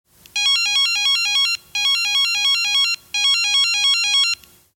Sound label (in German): Pieper